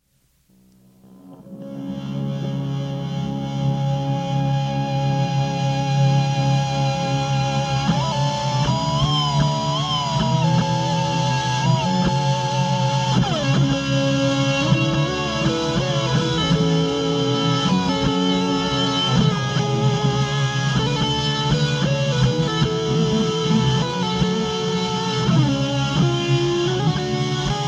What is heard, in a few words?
Riff Tapes